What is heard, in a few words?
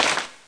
generic, impact debris; SiN